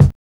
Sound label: BABY STEP, KICKS; Zero-G; Funky Elements